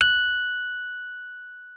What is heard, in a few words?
MKFF F#6, RHODES; RHODES A; Xsample VOL-1